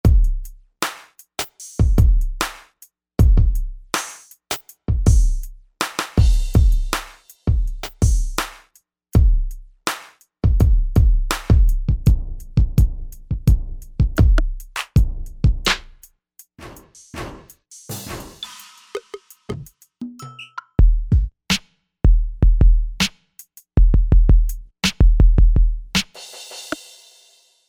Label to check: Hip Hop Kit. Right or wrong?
Right